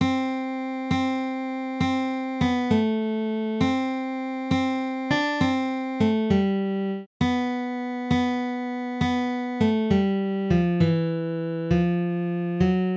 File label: guitar